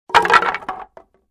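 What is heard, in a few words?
2x4 Several Planks Dropped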